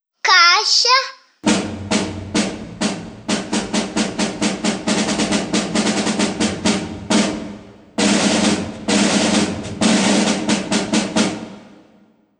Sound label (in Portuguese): Caixa